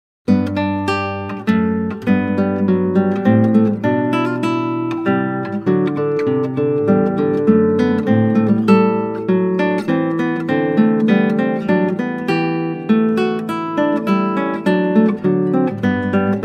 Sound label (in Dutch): Klassieke Gitaar; Bach 50 Solos